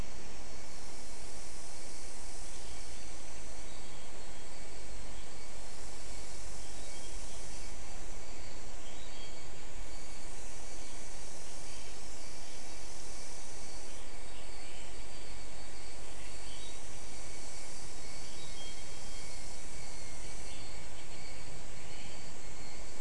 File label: mainly insect cluster